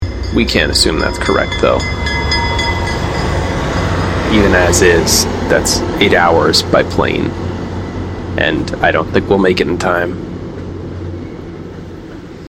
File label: De-hum after; izotope; rx10